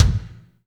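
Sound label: bass drum; Best Service Gigapack 2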